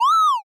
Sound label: cat meow